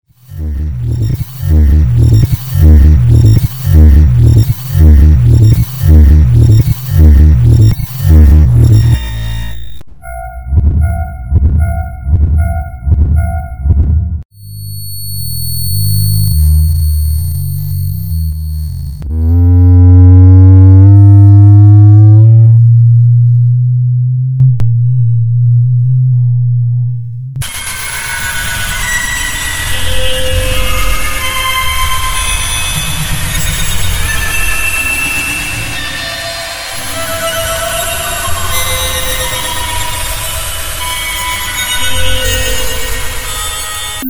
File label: Distorted Bells; Distorted Bells Of The Underground